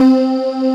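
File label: VOICE SYN C3; CMP - Analogon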